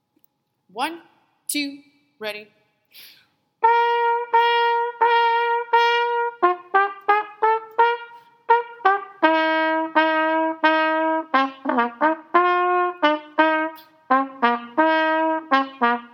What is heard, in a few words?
gof trumpet